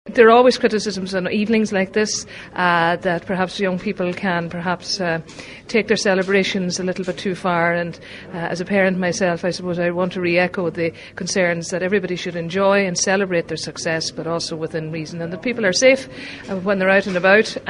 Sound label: cough